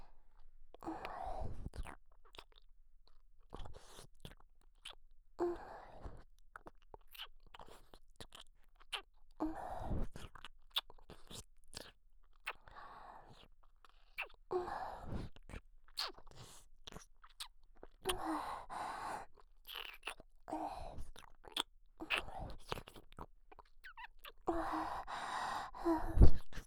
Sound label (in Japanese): 左右交互耳舐め; 耳舐め&吐息ぐっぽり10時間♪